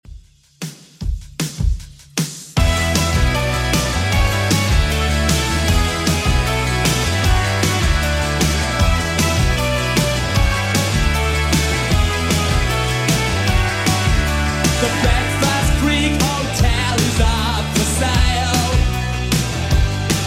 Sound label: 80s Redrum 154bpm